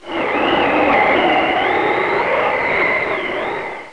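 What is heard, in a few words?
strong wind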